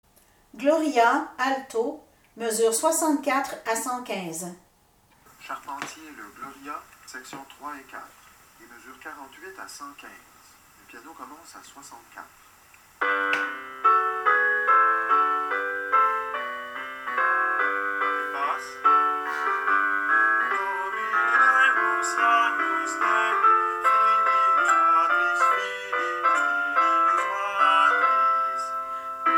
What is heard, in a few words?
gloria alto; charpentier